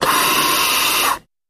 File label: Hospital Respirator Single Blast, Close Perspective